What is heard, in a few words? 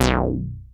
ARP 2600 B2, synthesizer; Hollywood Edge - Giorgio Moroder Rare Synthesizer Collection